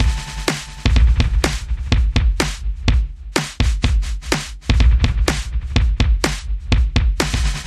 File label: Ambient House Mixed Beat 125BPM